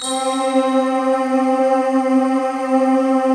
METAL BRTH

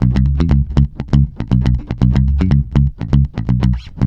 C#, groover, bass; Spectrasonics; Bass Legends

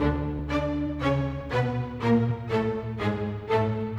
Strings; Sonic Foundry (Sony Creative Software); Orchestral VOL-2; Modern 01

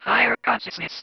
Female vocals and robo-vox

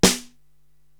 SD Dry, Korg TR-Rack drum machine

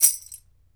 BEL RATTL, African Perc; E-MU Producer Series Vol. 7 – Old World Instruments

